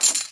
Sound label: pickup money; lego ninjago rise of the snakes